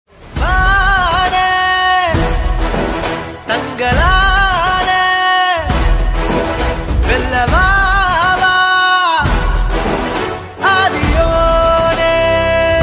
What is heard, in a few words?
bgm ringtone, tamil bgm ringtone; Chiyaan Vikram